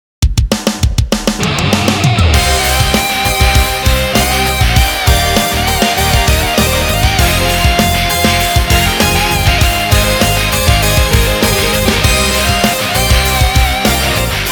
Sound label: Rock Mix